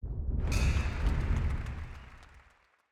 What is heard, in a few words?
ui quest done